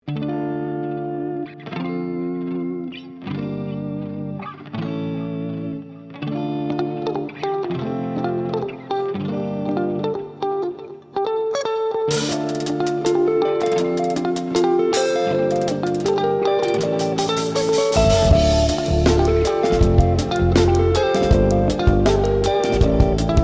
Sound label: Comedy Groove RNB